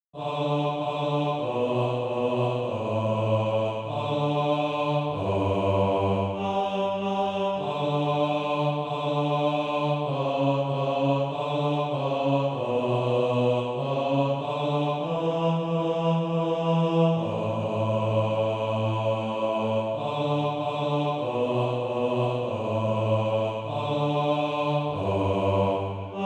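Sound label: bass, Practise Music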